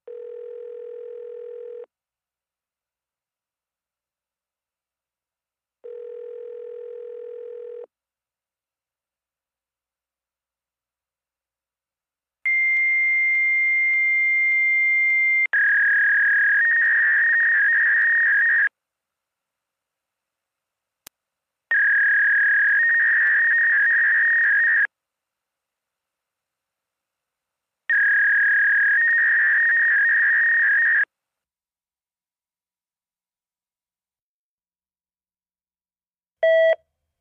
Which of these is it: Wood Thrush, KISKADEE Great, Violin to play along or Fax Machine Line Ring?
Fax Machine Line Ring